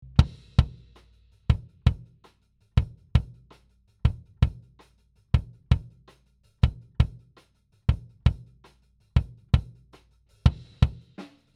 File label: Section A Kick